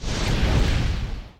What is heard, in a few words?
Effect Magic Burf